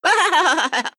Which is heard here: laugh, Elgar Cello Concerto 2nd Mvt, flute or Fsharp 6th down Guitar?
laugh